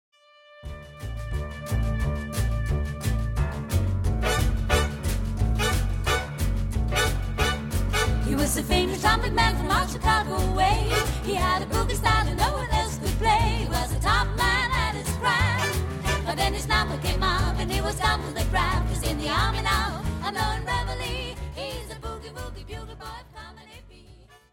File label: Swing